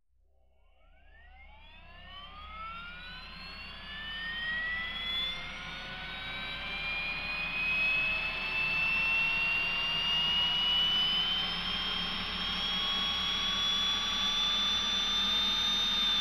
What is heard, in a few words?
mecha